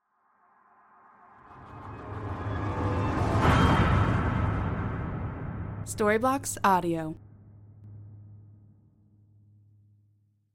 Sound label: horror stab musical hit